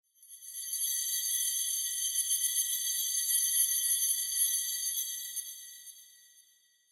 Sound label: Eerie Chimes